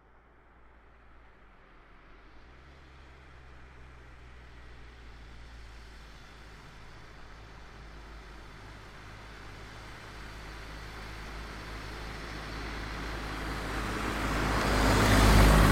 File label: Car Smart Car By Slow; 2005 Smartcar Fortwo; BigRoomSound